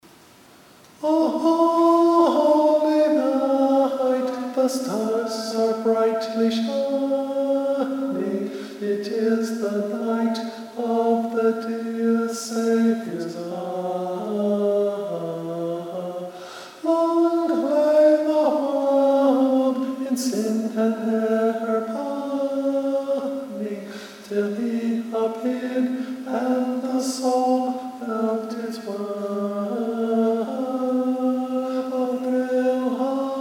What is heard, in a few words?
Tenor